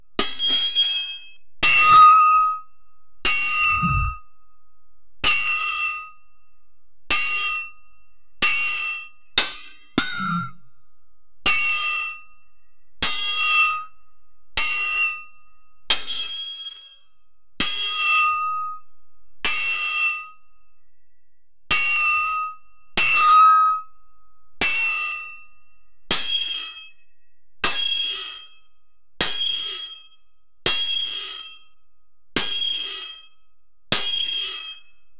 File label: scifi mech, assembly; iron man style